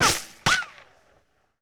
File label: Rico & Whiz By Multiple; Sony Pictures Sound Effects Series; Ricochets - Hits - Whiz Bys